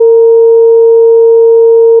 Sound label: additive voice single; FFTimbre